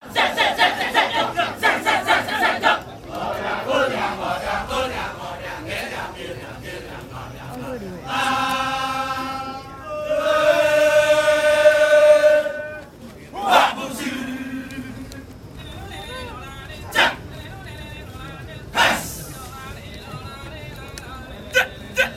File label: Bali Kecak Dance